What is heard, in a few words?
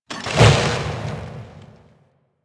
Freedom Thrower Start; Warframe; Caliber Chicks minigame; weapons; player